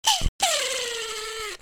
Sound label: Burst